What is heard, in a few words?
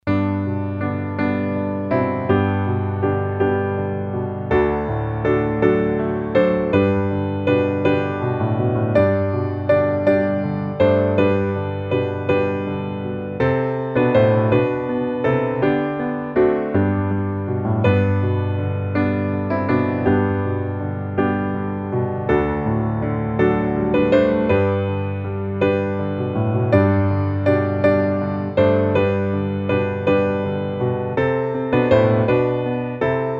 piano